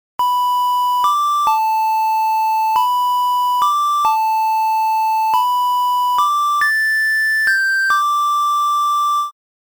Ocarina, Lullaby, 8bit

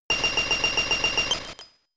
Hail; attack moves; Pokemon; Gen 4; DPPL HG SS

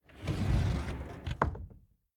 Door Slide Open; The Inn

